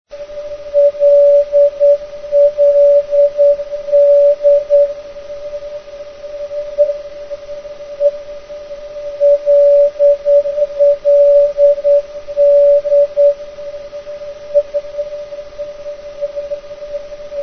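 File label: non-directional beacon; lanai; michael oexner ndb handbook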